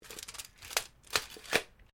TAPE MEASURE METAL RETRACT, Common metal tape measure; Hand Tools; SoundEffects-Foundation4